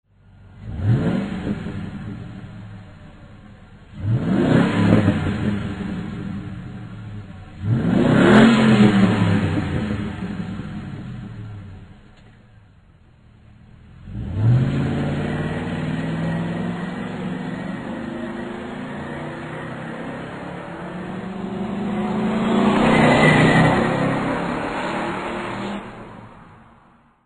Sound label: magnaflow exhaust systems